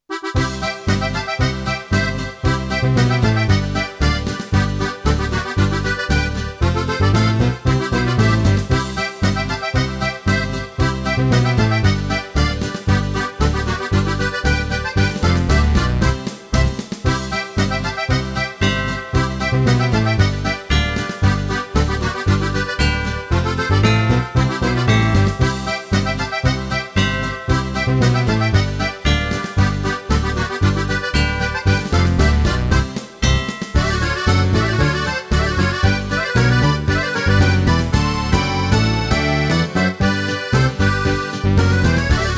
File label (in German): Glocken Polka